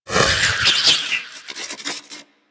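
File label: Shockwave Grapple Projectile By; Warframe; Venus; Venus Terra Shockwave Moa; Abilities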